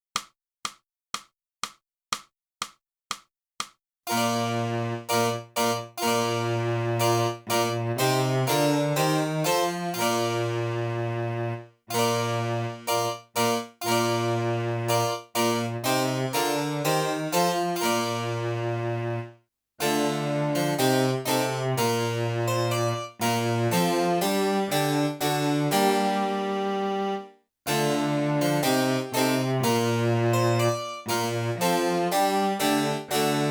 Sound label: flute, pavan